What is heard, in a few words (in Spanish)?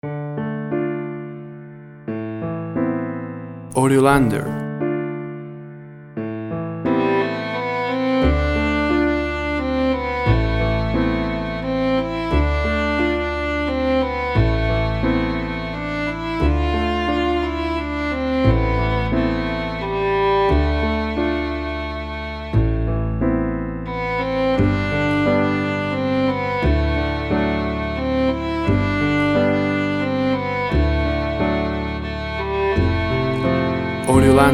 Bosque nocturno